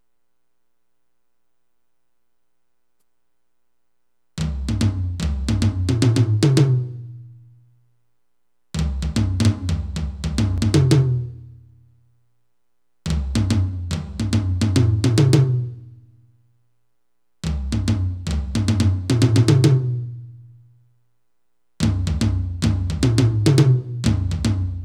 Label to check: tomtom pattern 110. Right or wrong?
Right